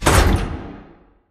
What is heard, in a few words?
sliding door sm verb close stop; beacon; Titanfall 2